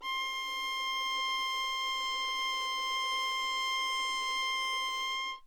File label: violin